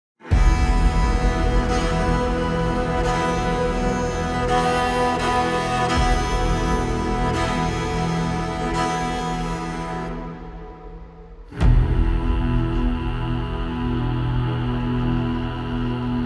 low bass

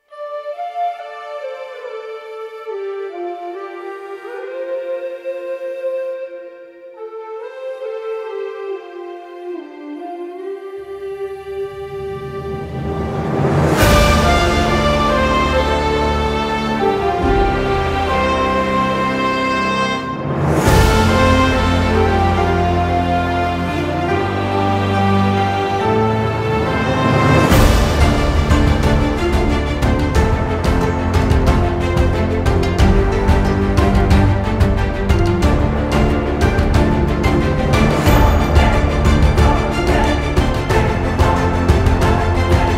epic orchestral version; lego ninjago theme overture

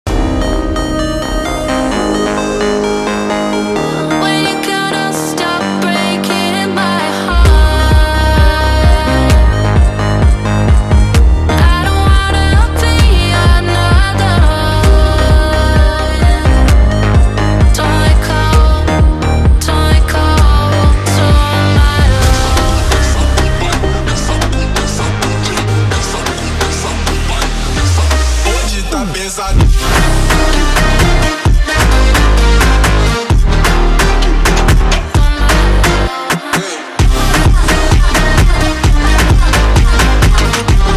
funk

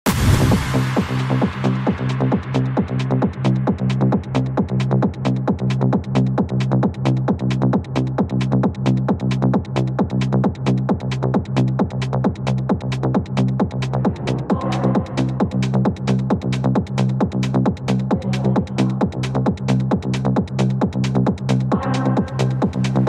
techno

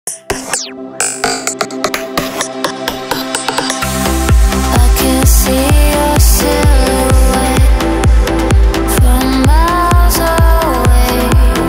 vocal trance

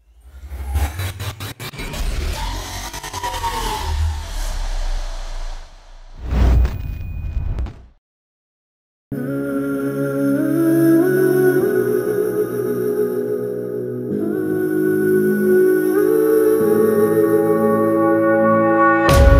hard inspiring rap beat motivational type

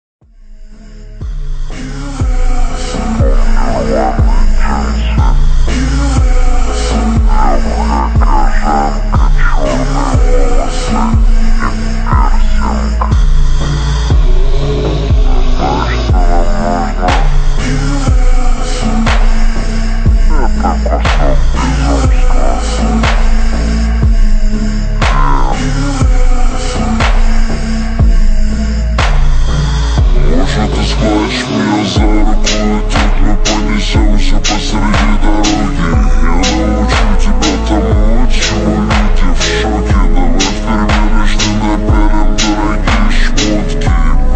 ultra slowed

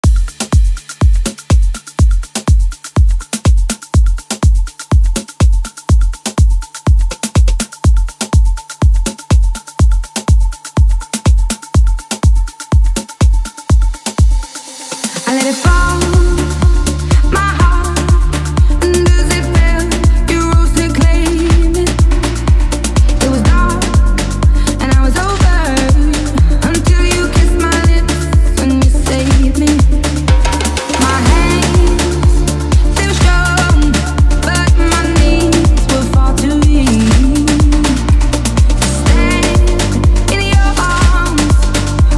afro house remix

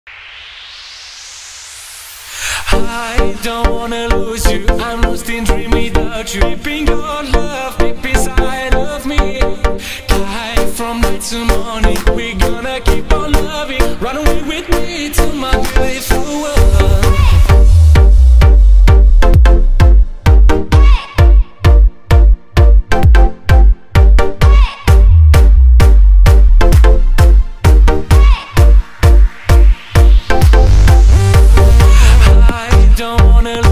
bass boosted

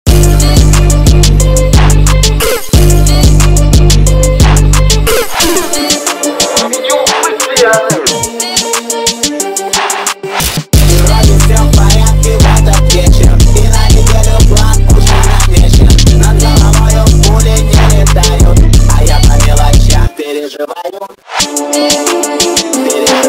jerk type beat x jersey club type beat

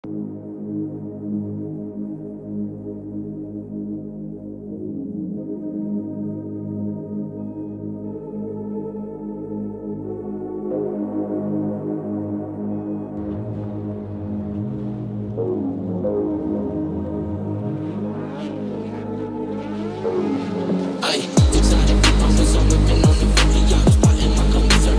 wave phonk

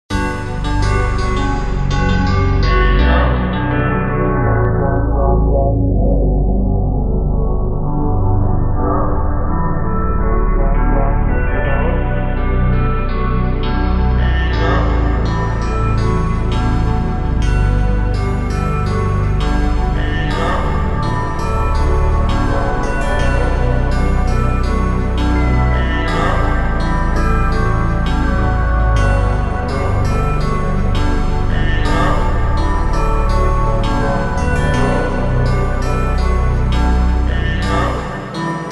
super slowed

